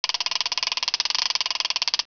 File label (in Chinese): 蜻蜓, 昆虫; 动物; 特酷声音素材库